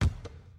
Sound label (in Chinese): 传球; 战中; nba